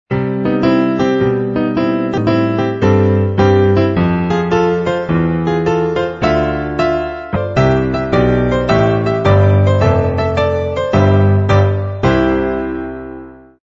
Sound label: Simple Song